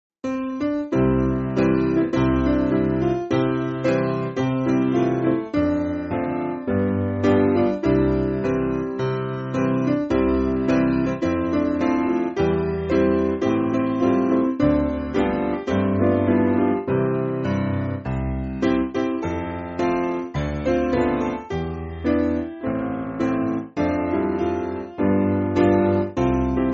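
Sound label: Piano, Music Backing Files for Churches; I Will Sing; Fillmore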